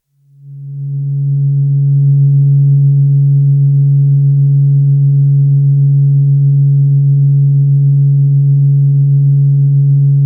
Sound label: Mid pitch pulsing hum; Deep Tone; Sound Design; BBC